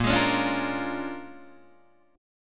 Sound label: Bb 7th Sharp 9th Bb Guitar Open D, chord